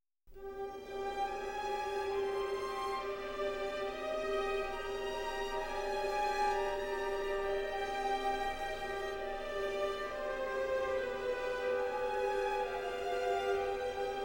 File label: Instrumental; Disney; Aladdin BOF 1992